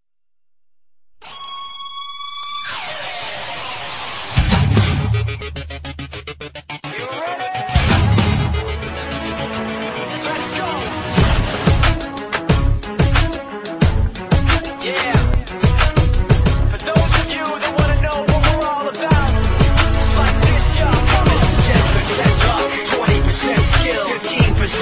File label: cheer mix, ringtone